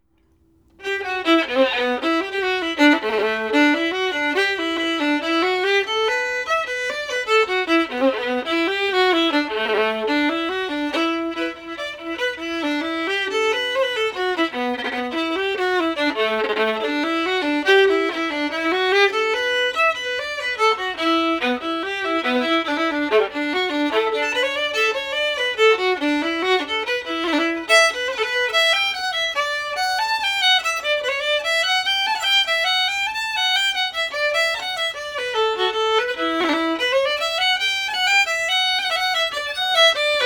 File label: Lads of Laois, fast